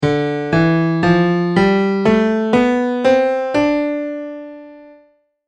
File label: Mode Dorian